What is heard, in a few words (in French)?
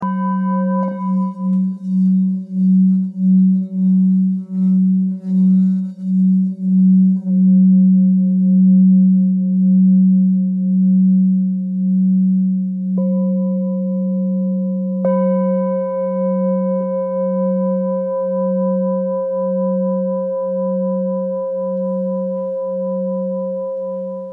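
Bol grave sol 1.14kg, Bol chantant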